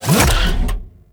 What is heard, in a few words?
Bullet Jump Land, Vehicles Motorcycle; Warframe; Motorcycle Ninety Nine